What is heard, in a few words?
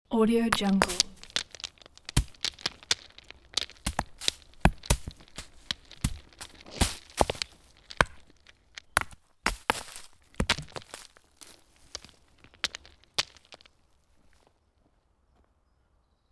stoning with many stones